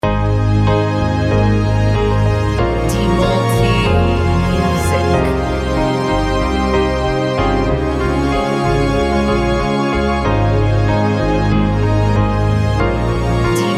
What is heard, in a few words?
instrumental modern piano; Aurora biasanya jadi tontonan spektakuler, tapi lagu ini menangkap sisi yang jarang diperhatikan: kesunyian di balik tarian cahaya.